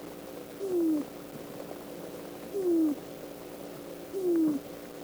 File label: Aphetea, treehoppers; Cocroft